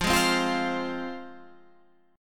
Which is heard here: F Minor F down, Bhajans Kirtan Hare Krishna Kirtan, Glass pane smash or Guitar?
F Minor F down